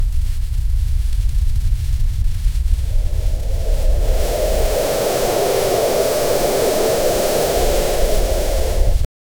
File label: wind rush cape flutter, aerial